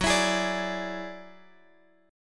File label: Gb 7th Suspended 2nd Sharp 5th, Guitar DADGAD; Gb7sus2#5 strummed